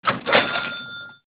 Jackpot

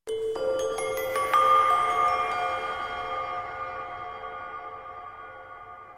Heavenly Bleep

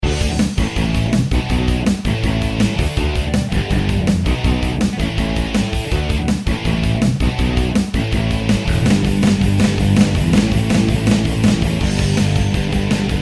Punk Melody

